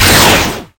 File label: missile